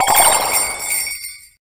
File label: heal respawn; gift